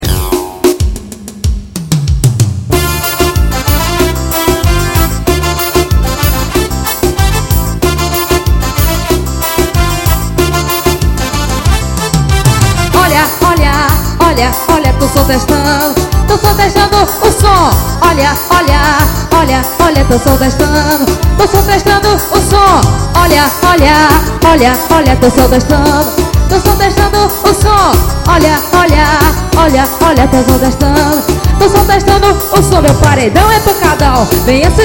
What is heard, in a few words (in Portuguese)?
forro